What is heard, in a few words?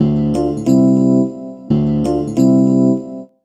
Piano Up, Drum & Bass Keys; Sonic Foundry (Sony Creative Software); Knutrix Drum & Bass